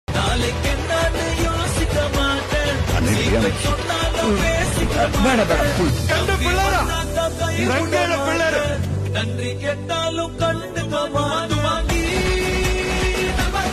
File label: tamil trending song tamil song; aavesham; tamil mass edit song